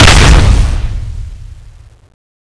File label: lance slash exp, weapons